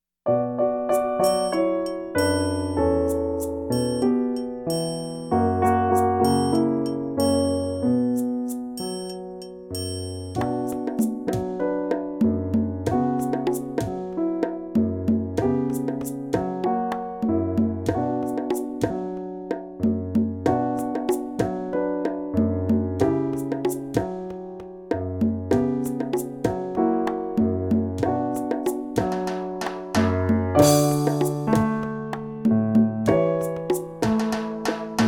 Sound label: accompaniment, violin; heypr for violin bk 2 silver